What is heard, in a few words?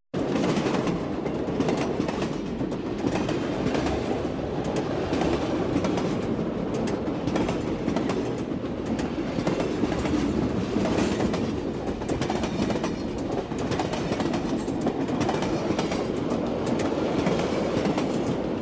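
train passing by; Poezd prohodit mimo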